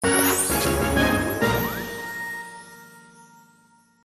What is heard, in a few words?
Reward!; MLP Friendship is Magic (Mobile Game); My Little Pony OST Repository